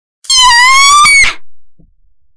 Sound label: kyaa, voice; announce